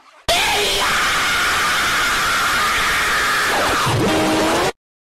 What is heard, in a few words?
extremely loud scream